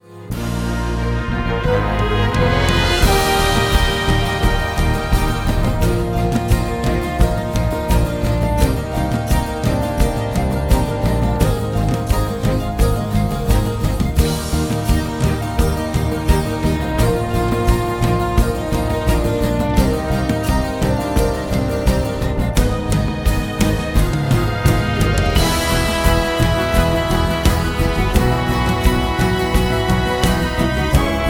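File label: accompaniment; auditions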